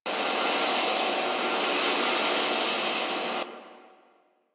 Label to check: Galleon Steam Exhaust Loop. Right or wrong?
Right